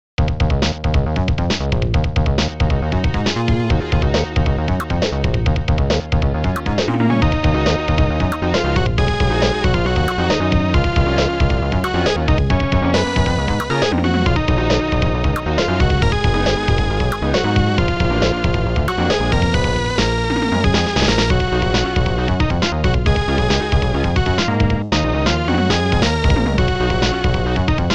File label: Stage BGM Amiga; Street Fighter II; U.S. Gold collection